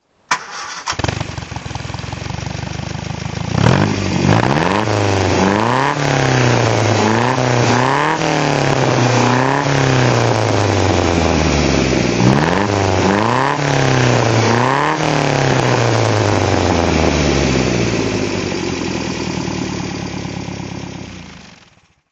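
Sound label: mg mga 1600 coupe throttle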